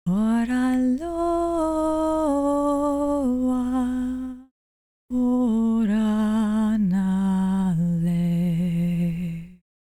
female song; Hollow Knight: Silksong

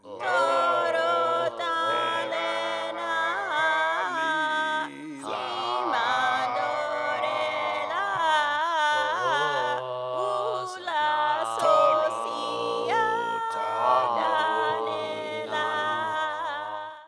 snails sing; Snails sing 01

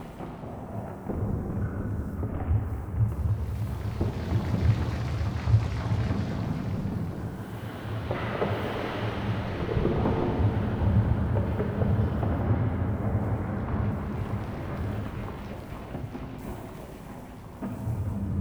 atmos crawl; Hollow Knight: Silksong

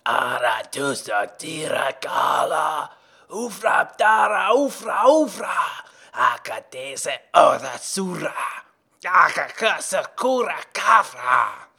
chant large

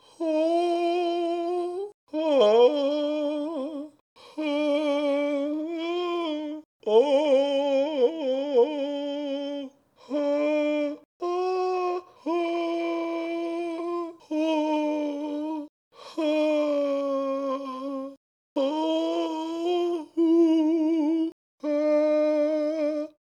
sing sad

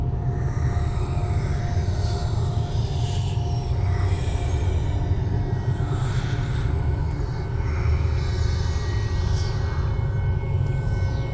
weaver whispers deep area; Hollow Knight: Silksong

